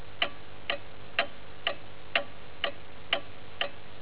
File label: clock; kids